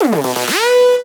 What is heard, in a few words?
Crisp Synth; SFX